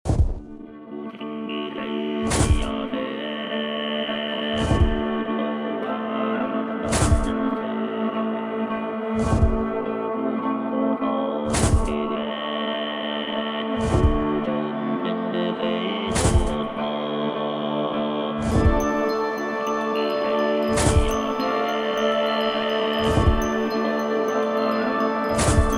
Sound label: snow mountain